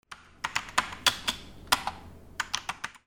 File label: OFFICE